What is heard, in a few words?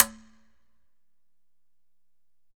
Congas wet, drums percussion